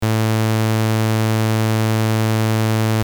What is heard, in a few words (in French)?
scie 110hz